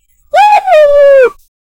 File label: the dog whines pitifully